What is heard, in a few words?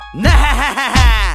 Nehehe